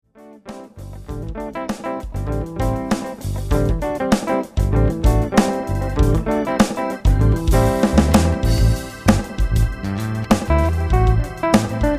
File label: smooth jazz